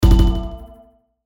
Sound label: BUTTON HIT RING; Multimedia; Buttons